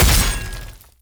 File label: Attack Heavy; bloom minigame